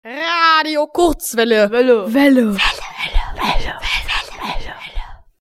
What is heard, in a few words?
Jingle Echo